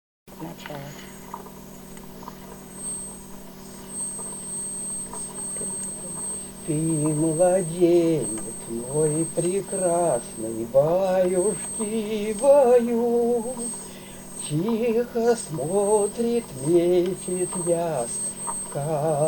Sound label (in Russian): Колыбельная